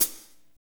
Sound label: HAT, Drumscapes Roland; Northstar; Fast Shuff